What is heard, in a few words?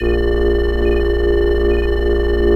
hev charge loop